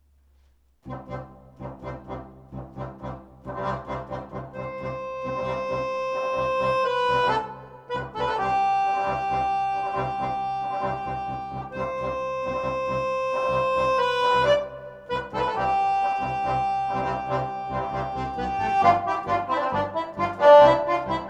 Tango Bolero

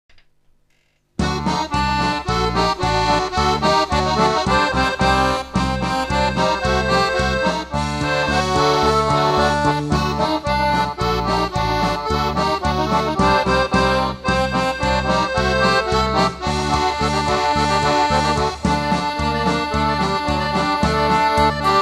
Klezmer

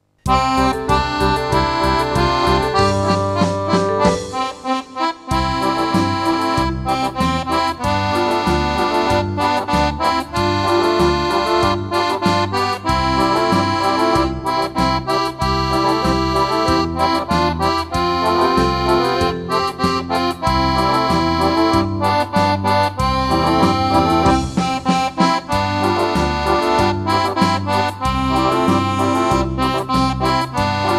Country

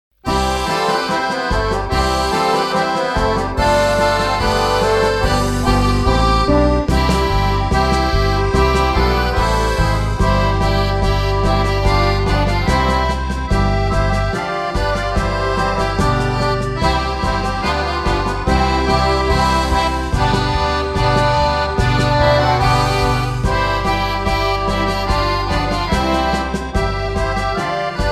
SWISS Bernermarsch

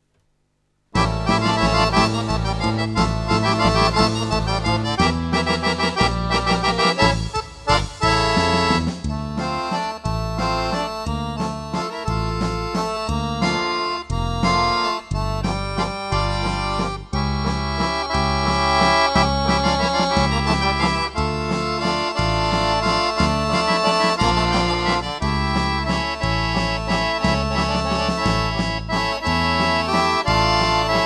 Klezmer

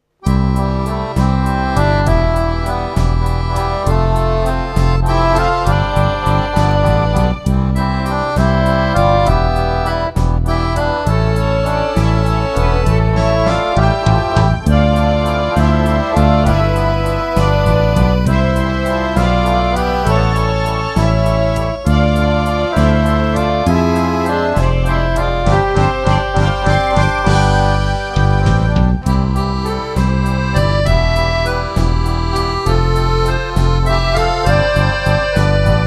Rock Café

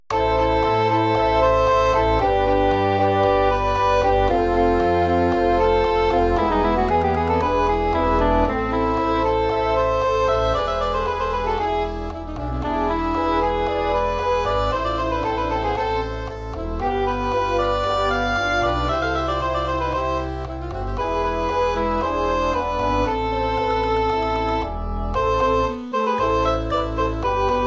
Tango; Tango Nostalgie

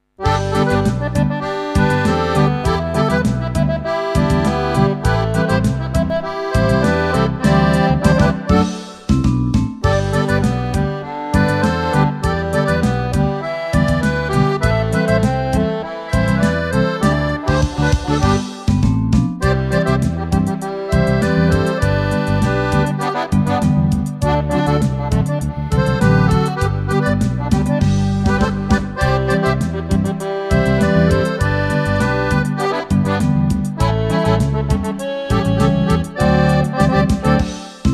Caribean Reggae

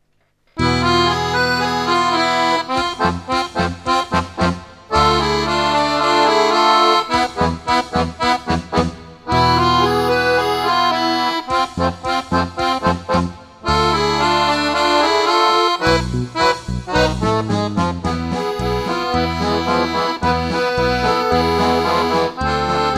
Klezmer

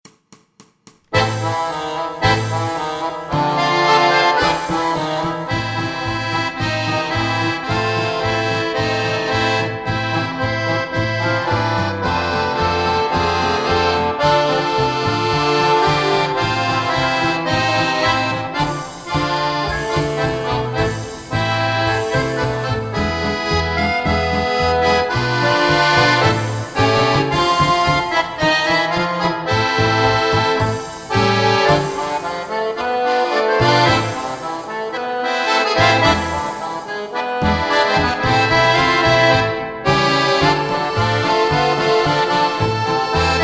Balkan

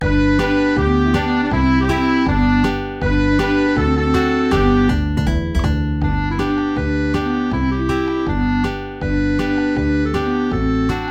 Western Dixie

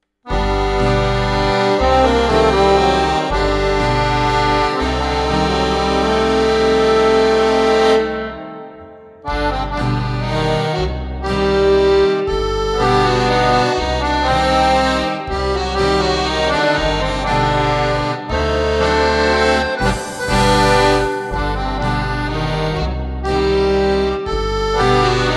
Balkan

